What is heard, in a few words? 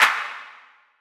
clap